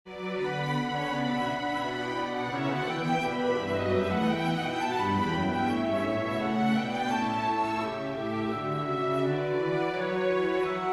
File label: winds strings; bach johann sebastian; der den tempel gottes zerbrichst